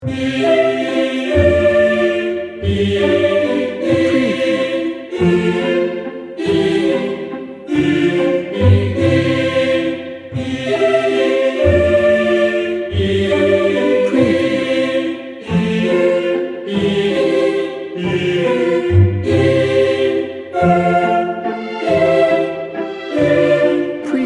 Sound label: morning song